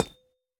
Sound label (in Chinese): 铜踩踏声